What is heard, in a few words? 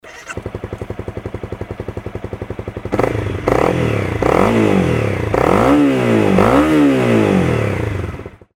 cbr250r lci day bf